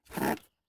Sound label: rabbit attack; Minetest; VoxeLibre; mobs mc